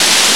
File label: Rain; SNES; Sound Novel Tsukuru